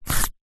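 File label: food pepper capsicum slice with knife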